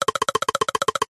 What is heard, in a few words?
cartoon fast clicks shake; zapsplat